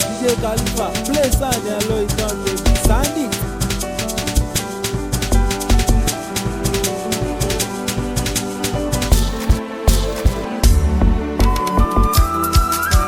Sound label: Feelings Beat